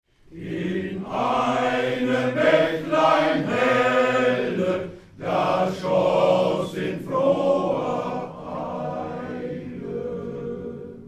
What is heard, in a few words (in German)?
forelle style; beethoven